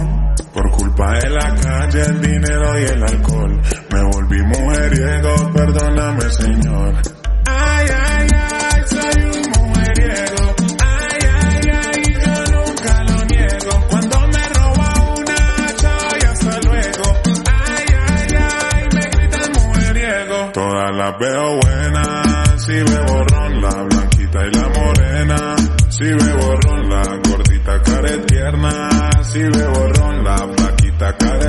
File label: slowed remix, bgm